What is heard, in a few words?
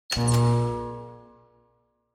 TV Turn Off Reverb